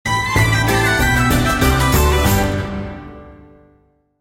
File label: freespin end; irish pot luck